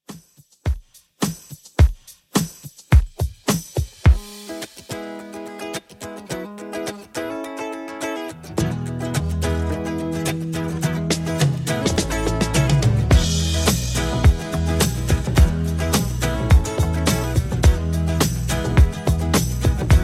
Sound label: 70s Redrum 105bpm; remixplanet